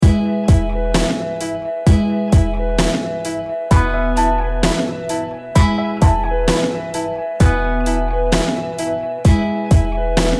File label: start round, music